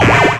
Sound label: SHORT, SHORTNOIS